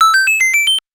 1-Up; Super Mario Bros. Sound Effects; Super Mario History 1985-2010

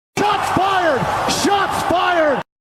SHOTS FIRED; SOUND EFFECT

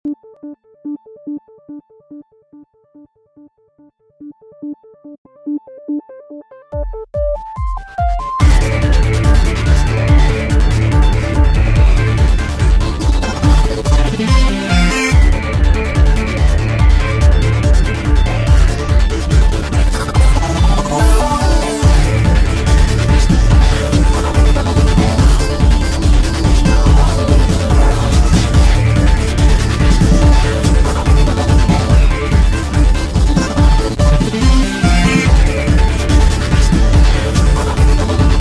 Piano Mix

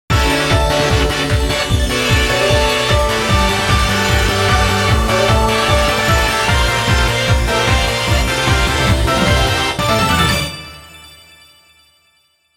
BGM Cute

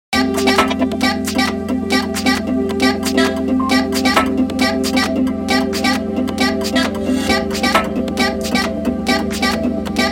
Noise Machines

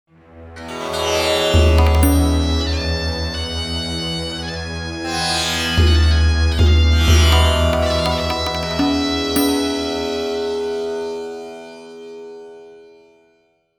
BGM Mature

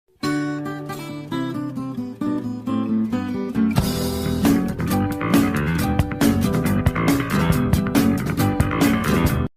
To Be Continued; Meme Sound Effect

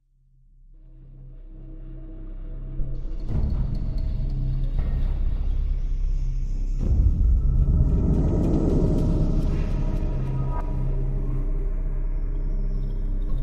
Ambience; Hunter's Grotto; Mr. Torgue, Big Game Hunt and Crawmerax

